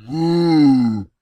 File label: minothaur damage; server-nalc; mobsounds